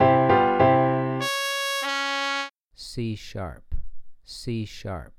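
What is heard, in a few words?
Trumpet 2 Note Melody Major c#4 c#3; ear training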